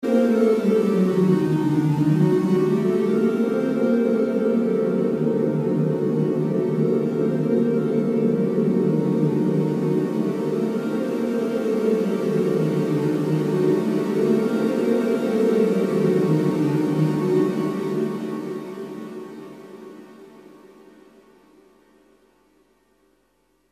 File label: fx vco